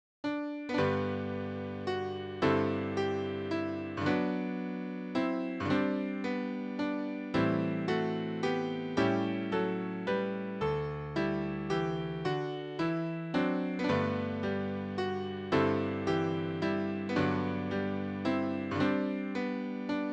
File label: It Came Upon the Midnight Clear easy piano